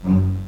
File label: saber